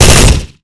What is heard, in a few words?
smg fire burst; weapons